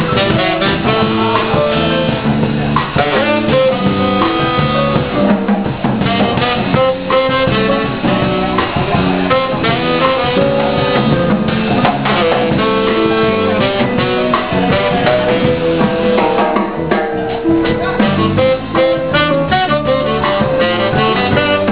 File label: hokey band; Alaska; CruiseShip